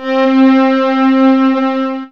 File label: MUTE BRASS, SYNTH FUNK; Zero-G; Funky Elements